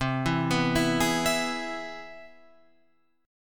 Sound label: C Augmented Major 7th, Guitar, Open F; arpeggiated; JGuitar